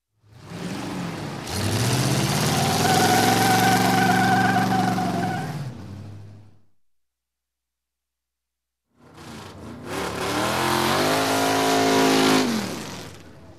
Stock Car Drag Race; Sound Ideas; Series 2000

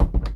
door close, household; NS Studios - ultra sound collection

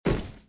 metal; firefight

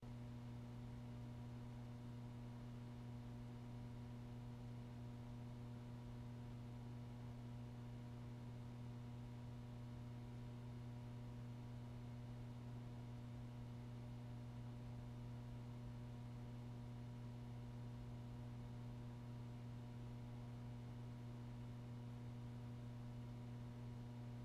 Classroom Roomtone; blindside

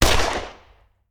Hand Gun; Sound Ideas; Guns

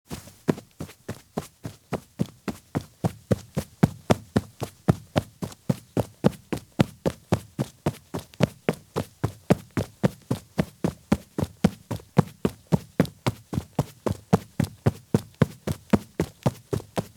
Men's Business Shoes Run on Grass; Sound Ideas; Footstep Sound Effects

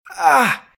hurt; RadwinIsland

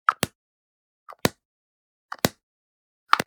Generic Button Plastic Small

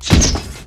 Grenade Launcher Shot; Weapons

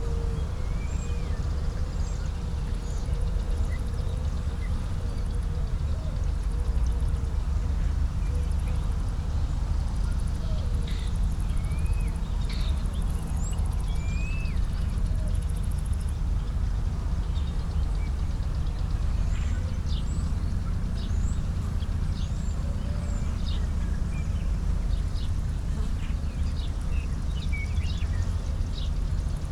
Suburban day birds sprinklers mowers; boom library

